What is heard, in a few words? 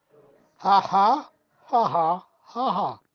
haha haha